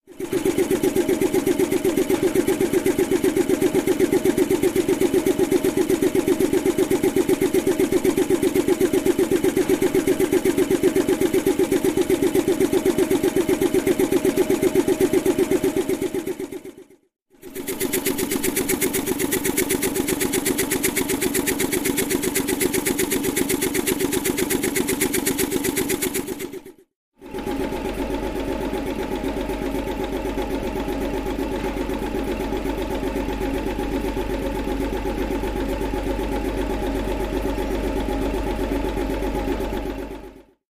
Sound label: Industrial Machine, factory